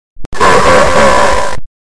hahaha

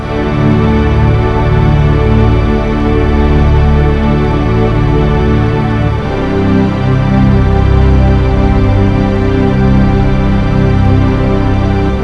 calmness, music

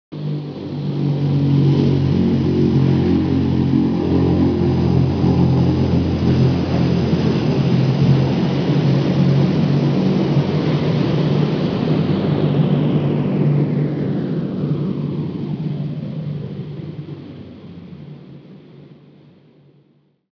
airdrop jet

